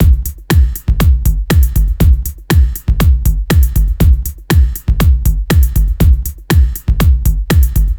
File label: 4 floor beat, trance